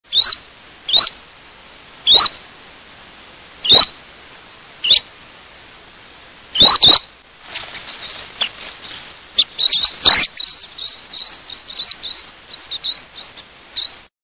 Least Sandpiper

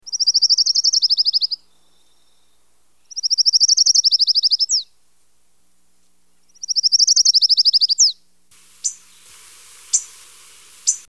Colima Warbler, songs

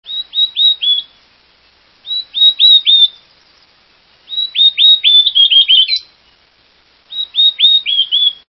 Golden crowned Kinglet, songs

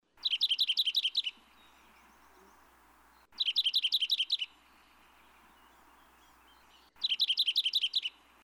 Olive Warbler

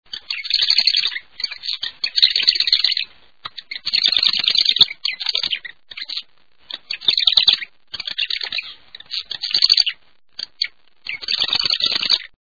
Marsh Wren

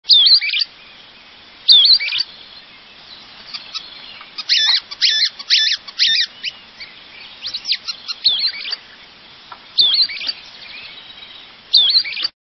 Sulphur bellied Flycatcher

